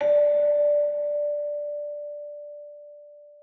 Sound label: Bell high D